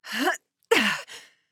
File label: Grunt Jump; Inara; Paladins